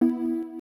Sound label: Confirm